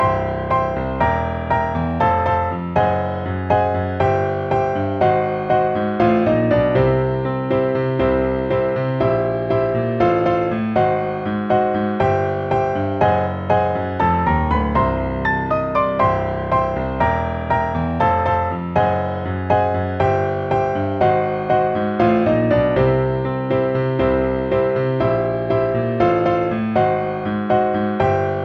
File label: Exercise in Contrary Motion